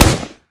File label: grenade launcher shoot; tf